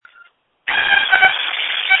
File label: Scream